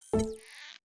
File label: Windows Default